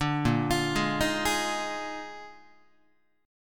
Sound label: Bb-11th-Bb-down-Guitar; Bb11 arpeggiated; Bb11 chord